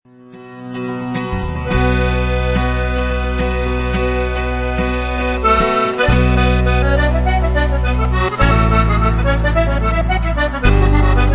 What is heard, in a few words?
drunken sailor hornpipe